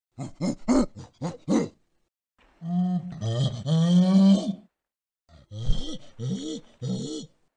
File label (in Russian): orangutana